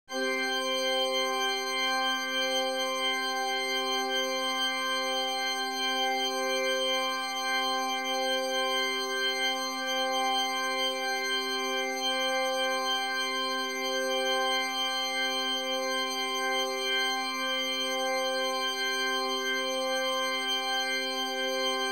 C Drone

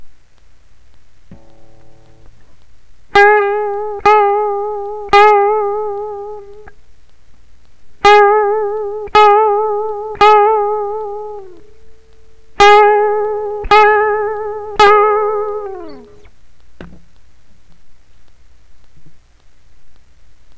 guitar vibrato

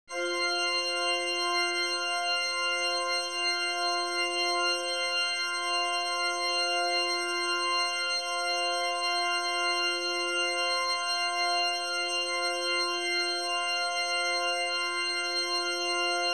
F Drone

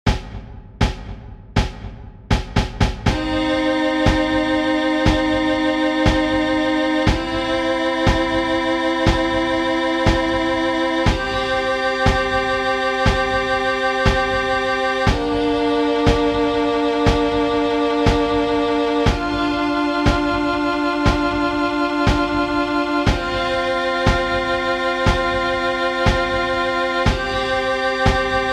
backing track 60bpm

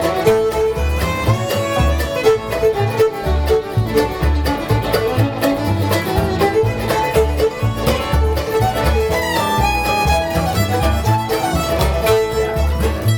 DD Fiddle

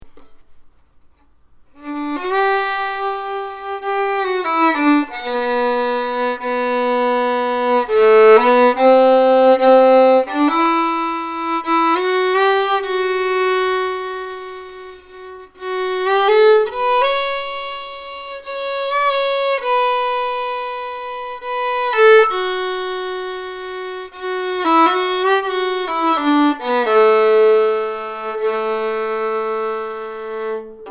just playing happy, fiddle

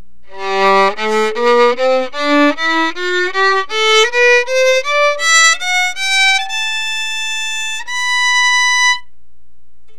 mic test

